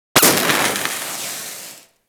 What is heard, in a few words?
Spark Shower; Ambience; Props; Fortuna; Warframe; Venus Open World; Venus Solaris United